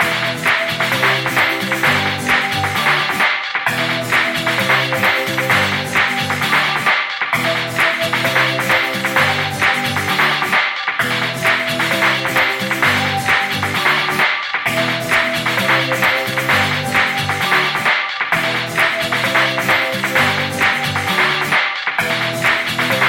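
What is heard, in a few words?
Piano Guitar Ringtone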